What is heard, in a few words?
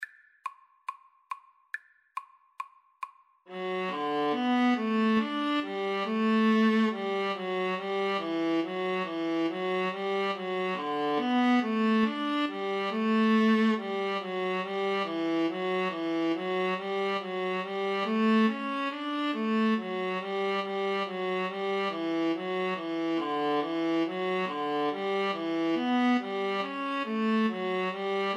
viola duet